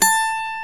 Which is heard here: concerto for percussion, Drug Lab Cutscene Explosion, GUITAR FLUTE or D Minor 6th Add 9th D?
GUITAR FLUTE